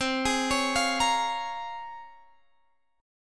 Bb Minor 7th C; Bbm7/C arpeggiated

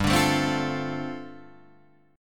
G 7th Suspended 2nd Suspended 4th, Guitar Drop D

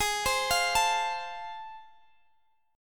A Minor Major 7th Sharp 5th G sharp

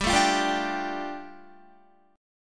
G 7th Suspended 2nd, Guitar; G7sus2 strummed

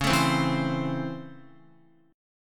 Db Minor Major 7th Sharp 5th Db down Guitar; 4E,4A,5D,5G,5C,6F; strummed

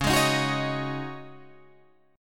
Db Suspended 2nd Suspended 4th Db Guitar Drop D

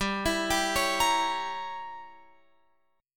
G Diminished 7th, Guitar, Open F; Gdim7 arpeggiated; x,14,16,14,16,17

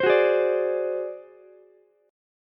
Ab 7th Flat 5th Ukulele, chord; Ab7b5 strummed; JGuitar

